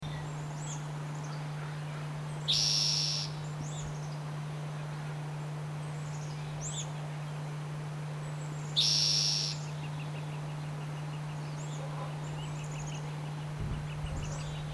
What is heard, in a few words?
towhee Call